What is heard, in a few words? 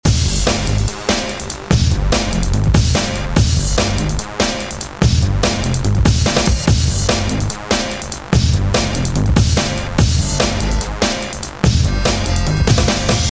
disco loop